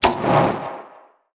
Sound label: Fire; Antihero